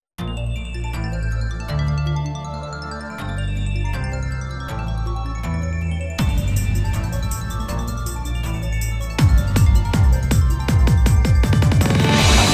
off Vocal

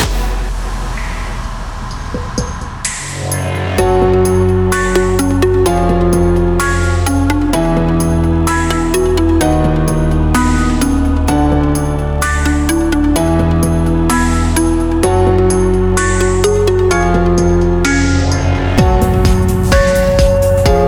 Progressive House × Future Bounce; 東方紅楼夢19

